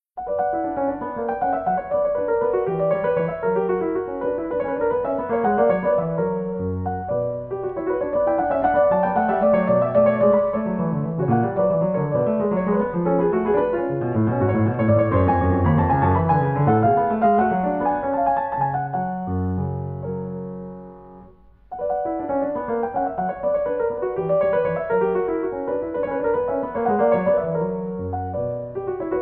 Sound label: French Suite B Minor Courante; J.S.Bach; BWV 814